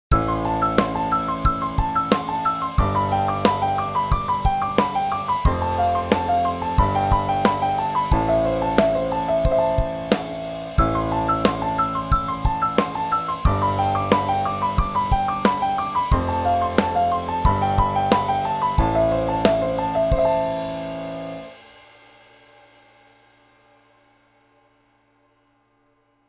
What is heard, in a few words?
rock piano fast 16th notes